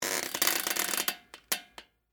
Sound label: Squeak Metal Springs, Bicycle, Seat; Texture; BlastWave